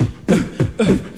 ya know what, Beatbox, Drum loops

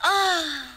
"oh" young girl sigh

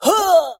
"huh", voice

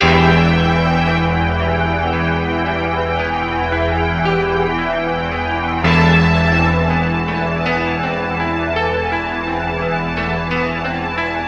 piano, sad loop

